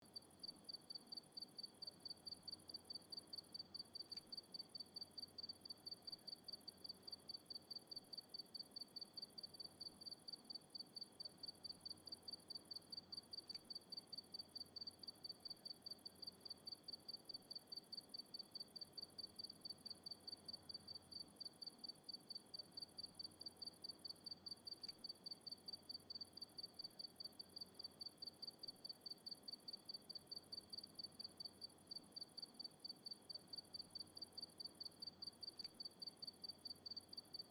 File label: Crickets country night; atmosphere